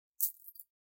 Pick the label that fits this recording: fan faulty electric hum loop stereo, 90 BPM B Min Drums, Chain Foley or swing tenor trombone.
Chain Foley